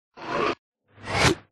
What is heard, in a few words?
chest; Minecraft